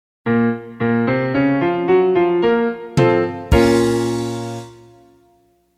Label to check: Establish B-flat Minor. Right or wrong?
Right